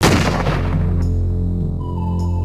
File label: death; singe